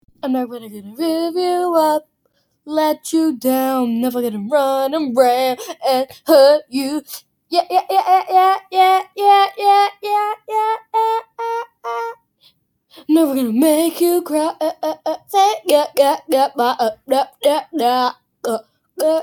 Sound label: imitation